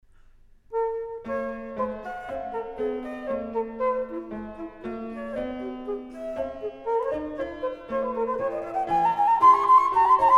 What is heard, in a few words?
flute sonata in b minor, presto; bwv 1030